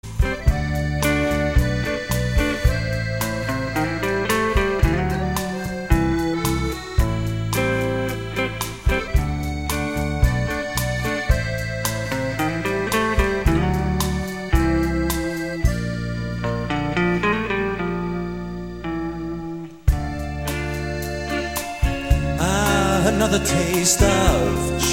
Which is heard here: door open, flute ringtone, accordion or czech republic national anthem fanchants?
accordion